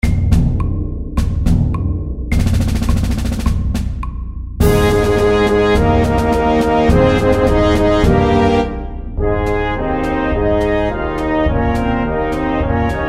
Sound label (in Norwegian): Fanfare and march MIDDELS